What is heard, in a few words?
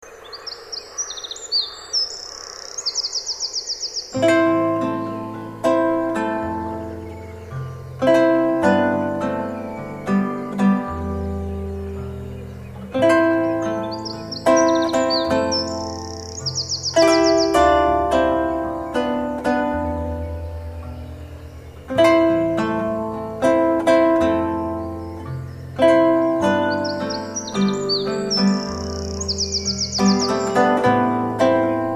wren